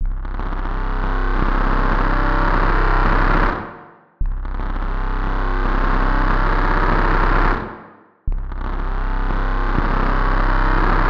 Hard Worker Loop E; martyn stonehouse

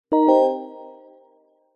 notification digital bell warm negative

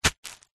foley footstep single on plastic sheet

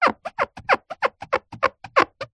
balloon rubber stretched out rub squeak

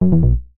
game tone error

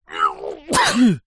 human male sneeze

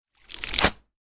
clothes shorts wet lift out of water drop ground; foley; zapsplat

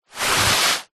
household umbrella closed slide ground

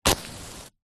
household match strike box light; zapsplat

